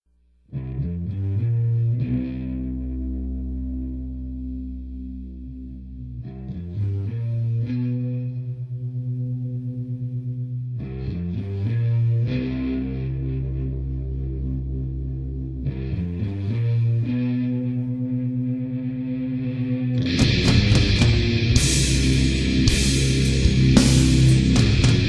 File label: electric tone